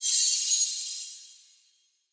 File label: Explosion Ice Sparkle